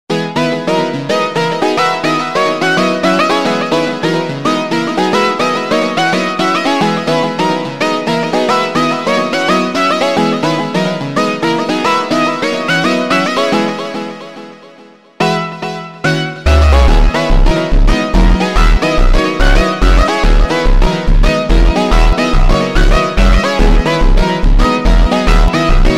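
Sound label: instrumental Sped Up, phonk music; funk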